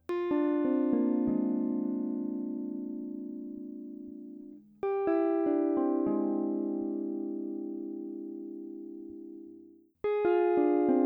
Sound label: f minor major 7 clusters